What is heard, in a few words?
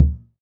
Surdo, Perc; Akai MPC500; LA Perc